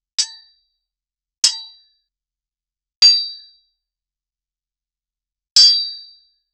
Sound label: shield blocking sword, combat